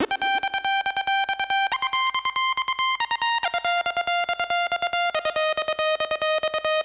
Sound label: Trance F1; Techno; Melody; TechnoMusicMaker